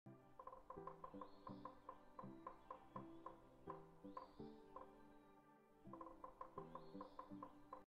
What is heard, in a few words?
Martens; سمور